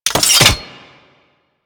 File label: Rolling Drone Turret Levitate End